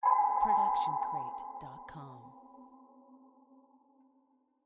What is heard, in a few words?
haunting pin drop; soundscrate